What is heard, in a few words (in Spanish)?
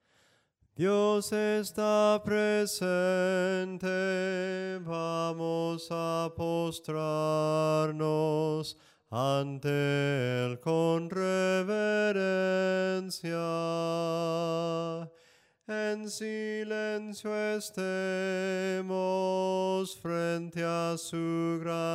SOPRANO CANTADO, himnos en melodia soprano